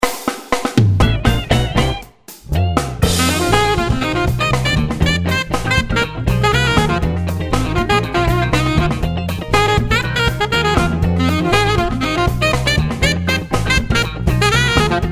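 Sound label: Alto Funk, sax; Gottsu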